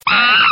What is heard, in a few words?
chimpanzee